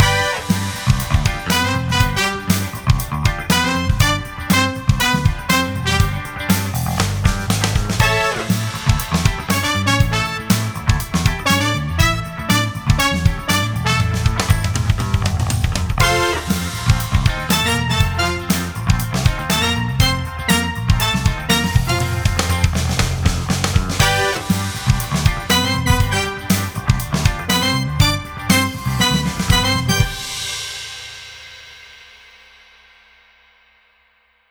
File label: SOUL POWER FUNK